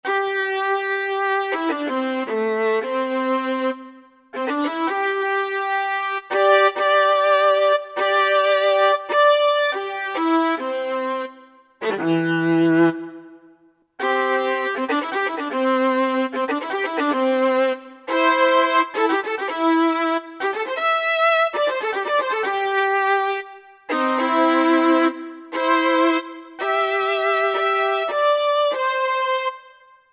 Fiddle Duo, Country; Roland SR-JV80-17 Country Collection

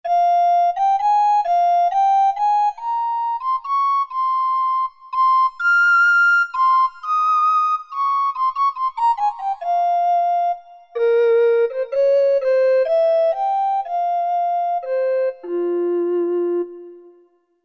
wood flute; Korg MSC-10S Ethnic

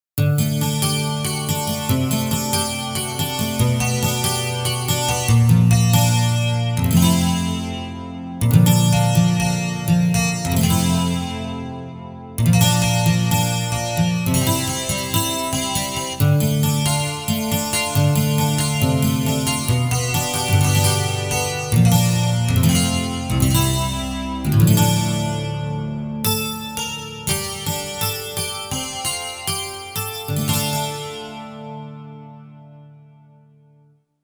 BrightGuitar, Roland JV-880; Factory Patches

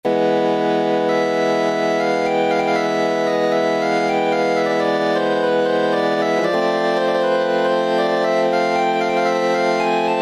FARFISA, Old School Keyboards; Universal Sound Bank; Soundscan 09

